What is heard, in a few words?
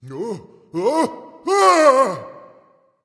fear, voice; smite; voicepack; ymir